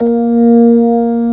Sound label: ORG B3, organs; Sound & Vision; Gigapack I CD 2